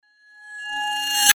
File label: Sword Sound; FXHome; Fighting Sounds